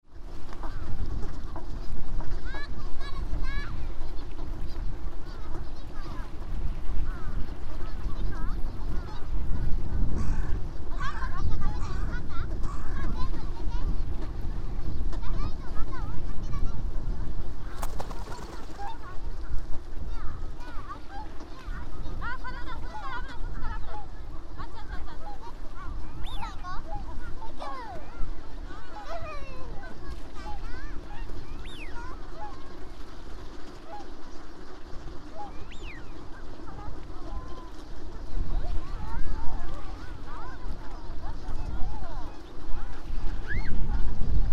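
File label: abukuma, Fukushima Soundscape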